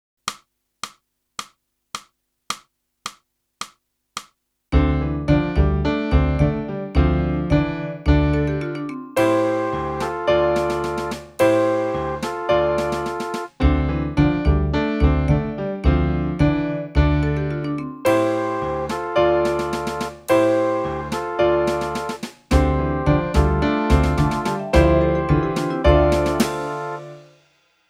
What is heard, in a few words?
sax